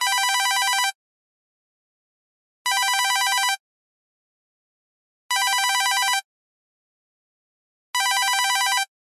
household telephone digital ring; zapsplat

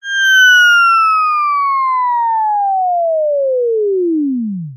cartoon descend whistle

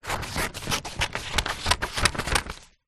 office scissors small blunt cut paper sheet; zapsplat

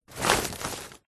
industrial tarpauling bag step on tread foot

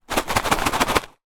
food cereal pack small fun size shake; zapsplat

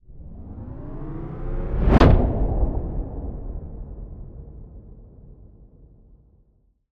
Swell To Impact; bottlerocket

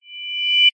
sound design riser short high pitched ring; zapsplat

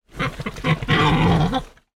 pig tamworth grunt angry

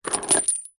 industrial chain medium sized pick up from wood

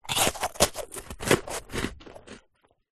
human eat crunch chocolate biscuit; tim tam

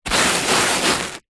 toast wipe rub on surface

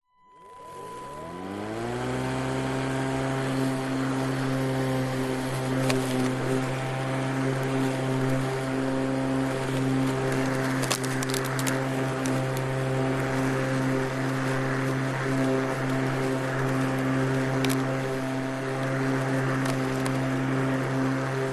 household mower electric on run off operator pov; zapsplat

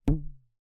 hair elastic band twang

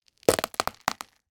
horror body part tear rip dismember; sound effects one

